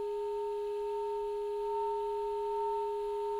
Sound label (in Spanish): Multiarmonicos